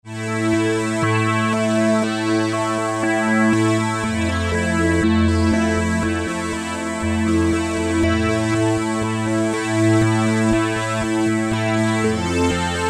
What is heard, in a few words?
Ambient S-H; Roland SR-JV80-11 Techno Collection